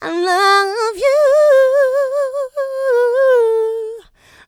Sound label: Female Vocals; Houseworx